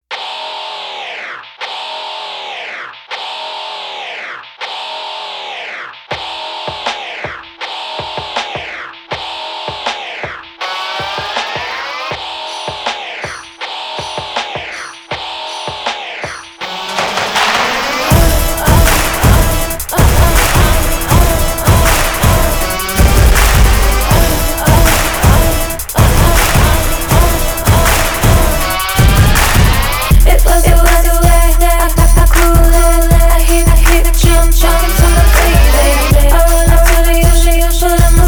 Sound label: Sleigh Bells Kids